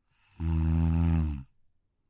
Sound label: snore